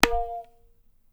tabla; Dirt-Samples

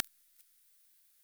click

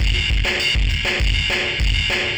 ind dist ride

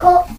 tock

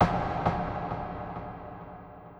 sd ambient

